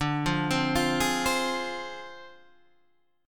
D Minor 7th Flat 5th Guitar Standard; Dm7b5; arpeggiated

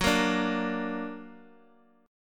F sharp Augmented F sharp

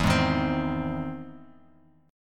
E Augmented Major 7th E down Bass Standard

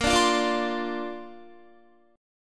Bb Major Add 9th, Bb, down, Guitar; Bbadd9 strummed

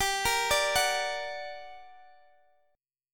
G Minor Major 7th, down, Guitar, Standard; GmM7 arpeggiated; JGuitar

